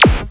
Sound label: zap